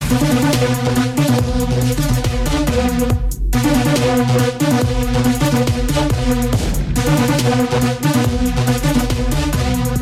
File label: Medieval Age